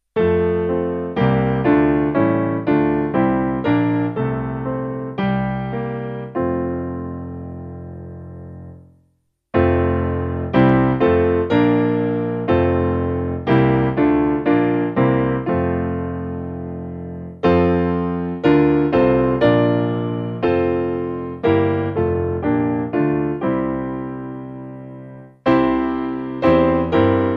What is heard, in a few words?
Piano; Here O My Lord; Toulon; hymnary